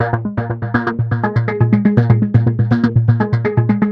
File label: 122 BPM Sequence Fmaj Dmin Tonic C; innovative sequences 1